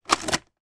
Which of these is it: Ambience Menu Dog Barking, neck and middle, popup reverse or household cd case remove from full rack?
household cd case remove from full rack